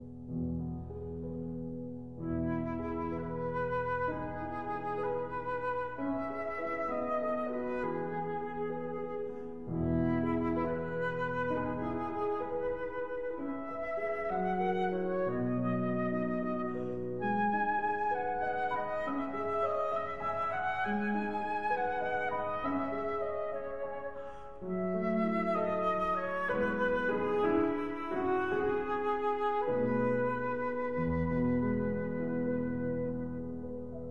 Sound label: Berceuse (Lullaby) for flute and piano; Gaubert, Philippe